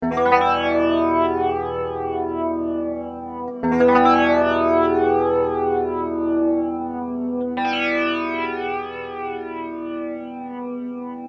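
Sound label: clean electric guitar jet flanger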